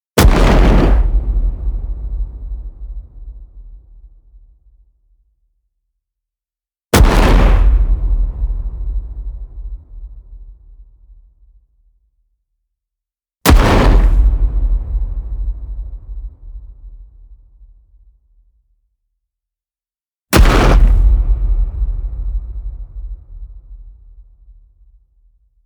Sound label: hit wood stomp